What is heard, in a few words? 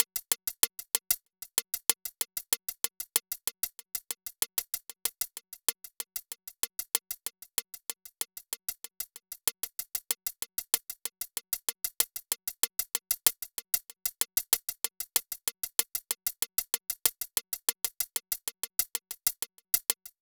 Constant Hats